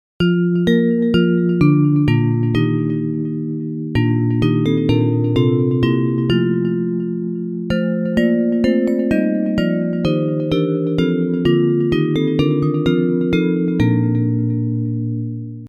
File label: Bells; Hymns